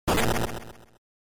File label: Noise; GB